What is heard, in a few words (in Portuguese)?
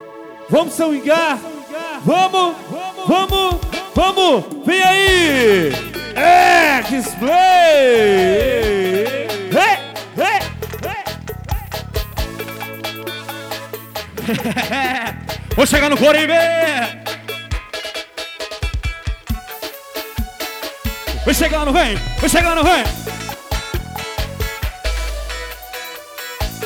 abertura ao vivo; em coribe